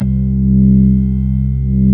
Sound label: ORG B3 C2; Best Service Gigapack 2